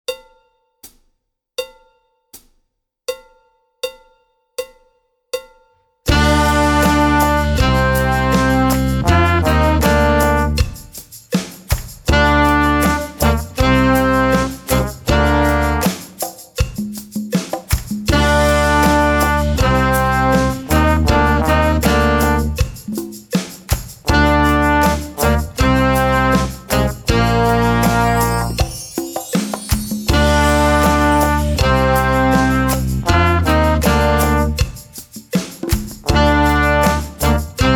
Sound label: Reggae